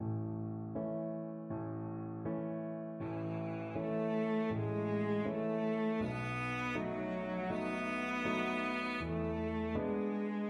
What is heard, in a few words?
aura lea, cello